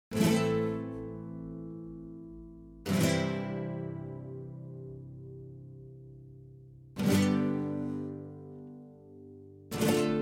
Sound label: Guitar; Kitaran luritusta (Ejay5)